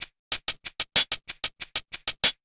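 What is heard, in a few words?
94 Drum Sound, Pure Electro; USB Soundscan vol.03; AKAI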